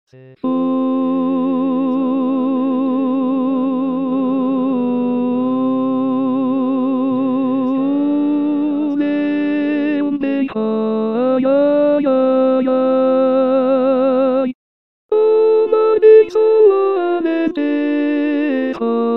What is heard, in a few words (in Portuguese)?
se fores ao alentejo contraltos